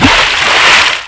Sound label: dolphin in; Nintendo; July 2020 Gigaleak